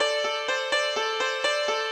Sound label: ethno, 125, A